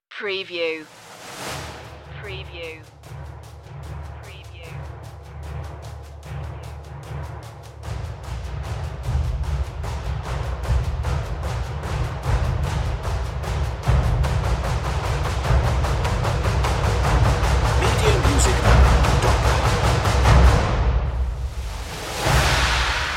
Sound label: perc